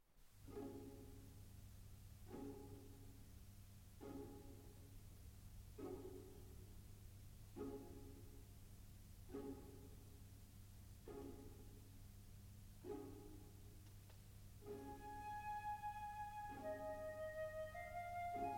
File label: Piano Concerto in B-Flat Minor; No. 1; Op. 23, TH 55; Tchaikovsky